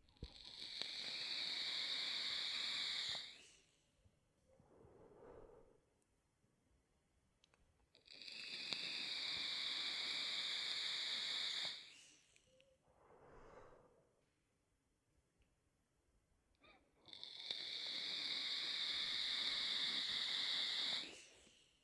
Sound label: Vape Inhale Exhale